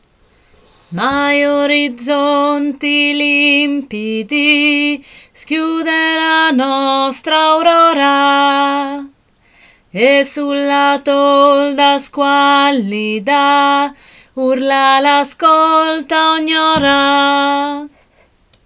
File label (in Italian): contralti